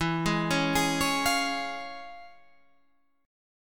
Db Major E; arpeggiated

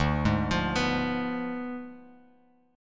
D Diminished 7th D down Bass Standard; arpeggiated

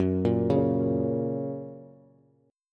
F sharp Major, Bass; arpeggiated; x,9,8,6; JGuitar

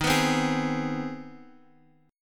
C Minor Major 7th Sharp 5th D sharp

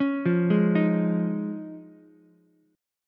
F Minor 7th Sharp 5th, Ukulele; JGuitar

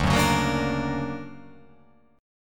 D Augmented Major 7th down, guitar

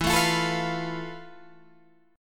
F Major Sharp 11th E

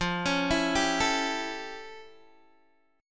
F Augmented Major 9th Guitar DADGAD; 15,16,14,12,12,x

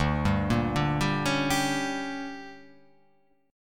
D Major 7th Suspended 4th Sharp 5th

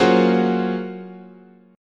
Gb Major Sharp 11th, chord, guitar

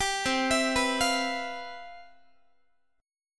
C Major 11th, Guitar, chord; CM11; arpeggiated; 22,20,19,21,21,x; 4A,4E,5A,5D,5G#,6C#

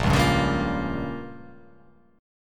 A sharp Minor 6th A sharp down, chord

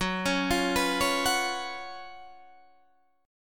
Gb Major 7th Suspended 4th Gb, guitar

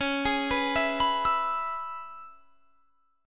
C sharp Minor 7th C sharp, chord; C#m7 arpeggiated

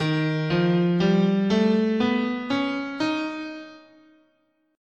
D sharp Whole Tone, scale

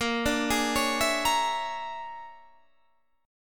Bb 7th Sharp 9th Flat 5th Bb, Guitar Standard; Bb7#9b5; arpeggiated; JGuitar

